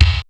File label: BD GATE; drum; BASSDRUMS